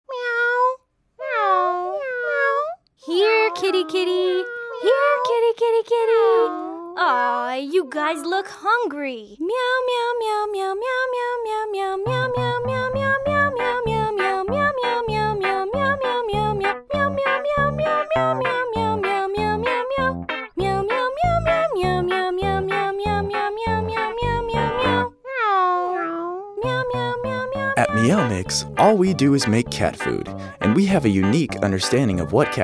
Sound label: meow mix